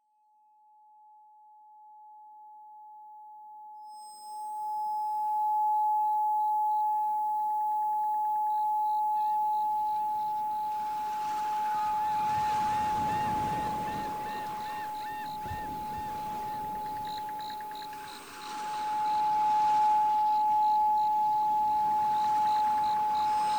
852 Hz

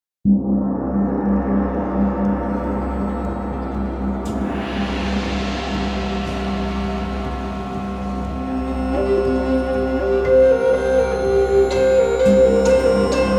Throat Chakra

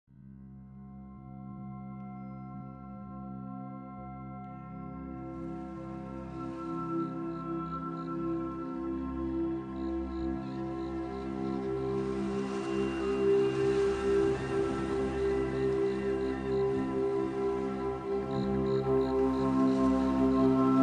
Lullaby Bass Flute

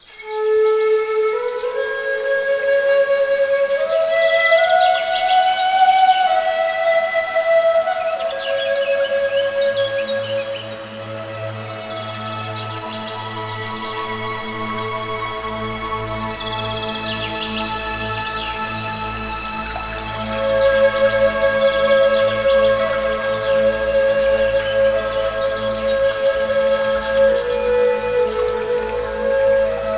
Spring At The River 432 Hz

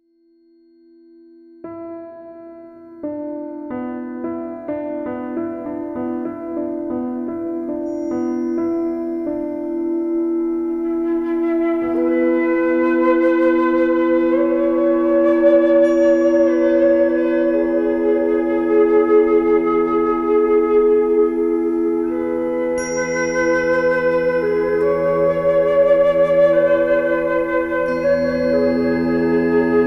I Am Frequency Pulsing Through My Soul

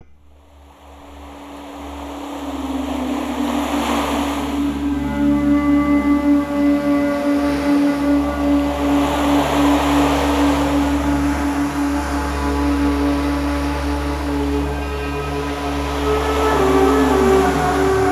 285 Hz

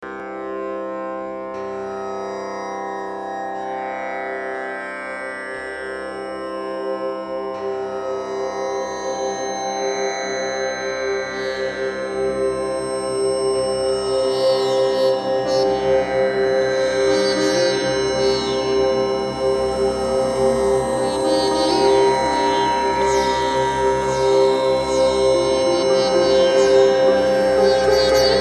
Love Frequency Meditation

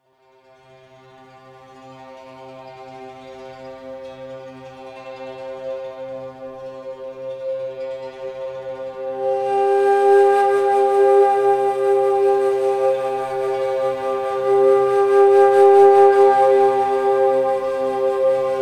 528 Hz Meditation

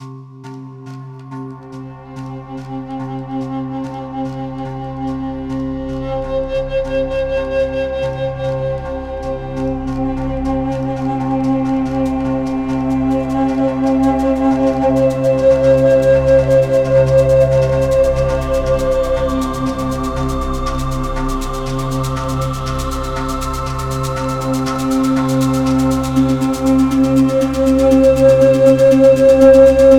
528 Hz

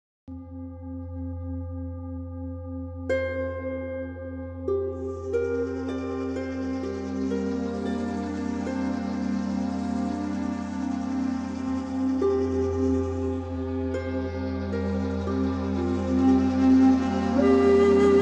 528 Hz

